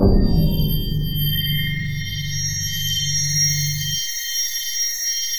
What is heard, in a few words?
ICICLES L; NorthStar - The Wizard VOL-2; DRY ICE